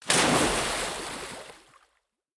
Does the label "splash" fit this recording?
Yes